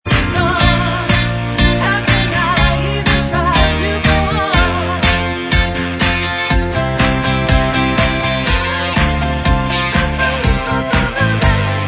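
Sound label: medley, backing vocals track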